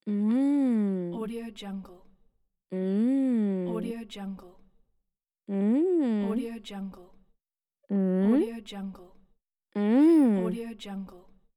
woman girl saying mmm

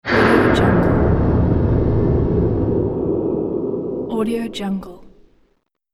explosion fallout

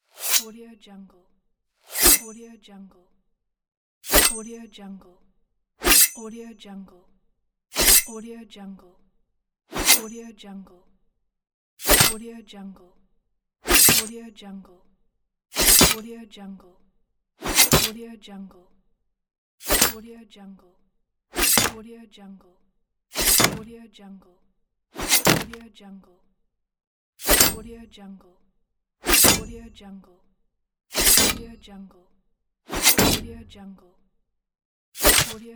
dagger pack

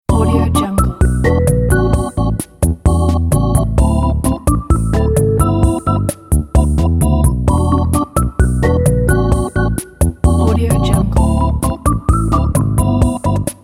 supermarket organ; revturkey

